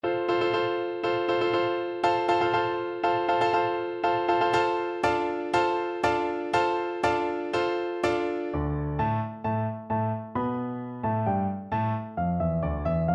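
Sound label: Royal March of the Lion for Trumpet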